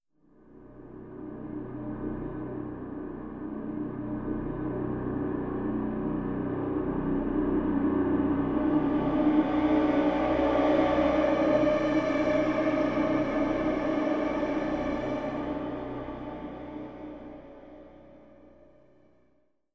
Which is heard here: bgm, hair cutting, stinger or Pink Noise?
stinger